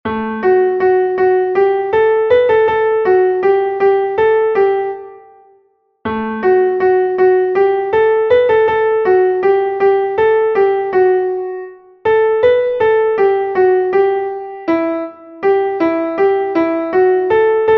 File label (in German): klavier; MelodieMeister